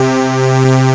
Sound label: Synths Note C